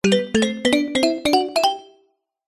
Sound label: cartoon footsteps playful carefree ascending climbing; zapsplat